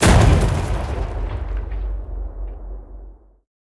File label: Ship Destroy; MiSide